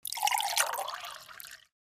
pouring

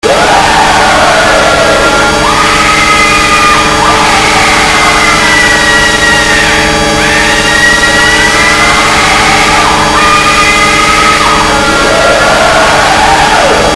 scream

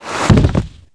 fall pit

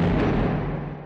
door slam

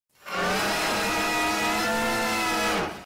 scream; dobby

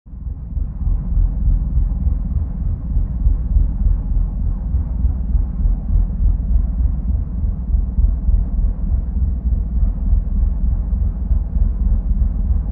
ENGINE ROOM

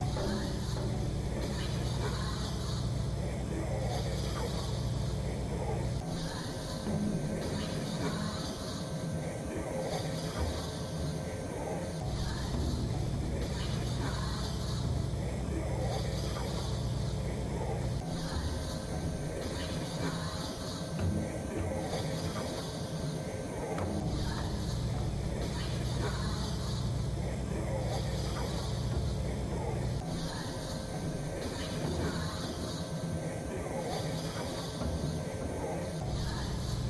brain sounds; The Silicone Town

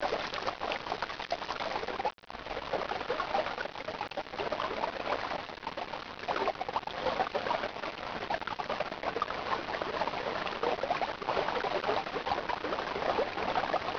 water flowing; Fizz

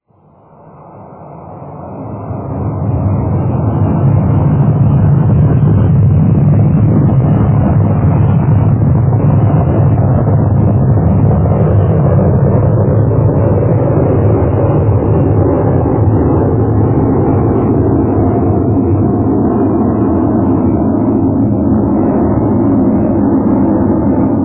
rocket; floridafantasy

